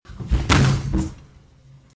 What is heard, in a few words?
kitchen bench impact